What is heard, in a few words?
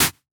Glitch, One Shots, Glitch FX